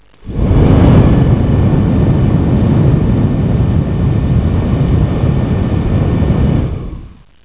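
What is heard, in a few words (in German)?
Föhn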